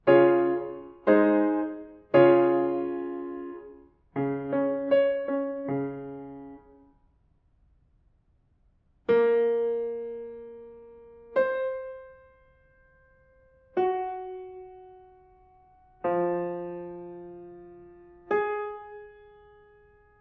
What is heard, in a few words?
diatonic collections